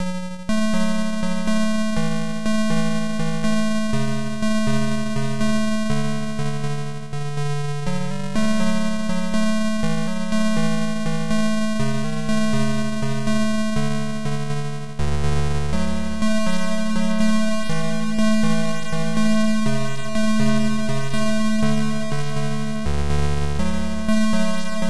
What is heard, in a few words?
Piano